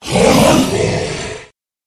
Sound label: monster dire wolf